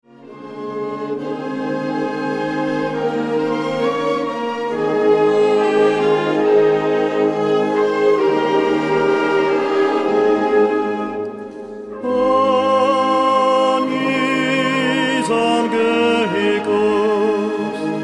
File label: Panis Angelicus, orkiestra